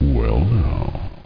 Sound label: Attack; senet; nile; Ramses